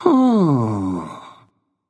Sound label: nv thinking; smite; voicepack; ganesha